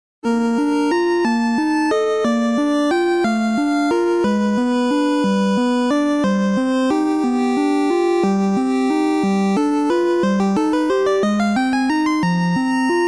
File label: Menu Screen